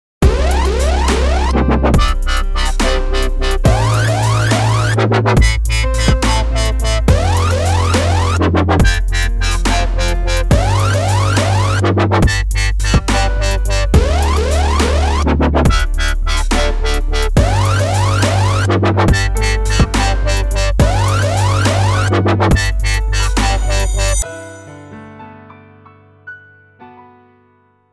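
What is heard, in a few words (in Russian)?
dubstep transition short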